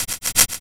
HH CLOS FX, Big Beat Samples; Big Beat Samples & More; AKAI; HHCLOS2 KIT

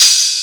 open hihat; Techno Trance Essentials; DRUMS

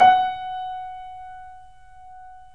3D Pianos Yamaha C7; E-MU Producer Series Vol. 5

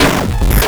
grind, EMU XL-7

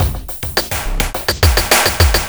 housefunk w fill; Sonic Foundry (Sony Creative Software); Ugly Remnants VOL-2

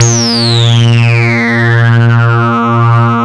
TAURUS, Moog Synths; E-MU Formula 4000 Series Vol. 3; Analog Odyssey

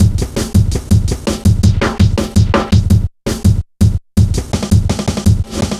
Hard Edged, Drum Loops, 165.5

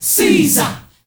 SEE SAHH L, GRP CHANT; Spectrasonics; Heart of Africa 1

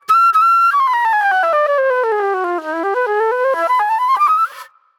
Flute

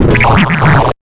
JUMP 130 FX, Big Beat Drumloops; USB Soundscan vol.25; AKAI

